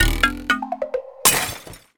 gadget upgrade fail

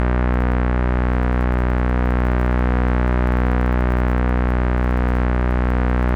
tone

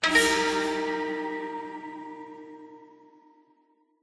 Mystery Pluck; unrest indigo

